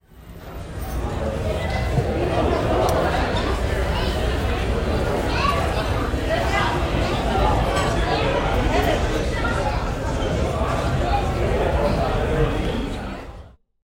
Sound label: crowd eating ambience